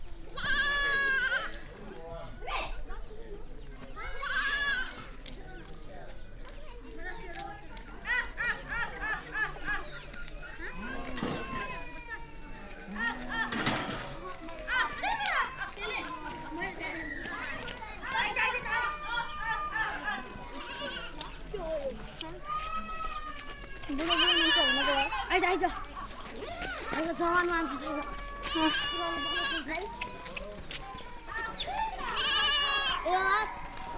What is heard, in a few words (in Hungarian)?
falusi élet nemzeti park